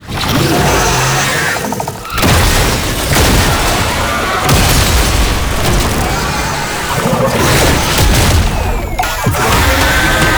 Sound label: Intro Cinematic; gameplay; Babau; Warframe; NineteenNinetyNine; Stage12PartyOfALifetime